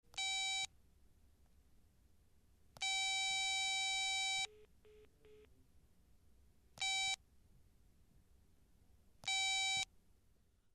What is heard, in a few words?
Tone dialing sounds on the handset; telephone